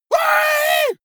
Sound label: monster growl attack high; schreie